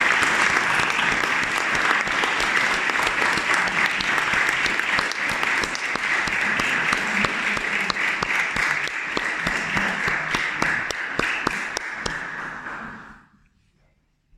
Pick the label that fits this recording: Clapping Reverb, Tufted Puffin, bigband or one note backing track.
Clapping Reverb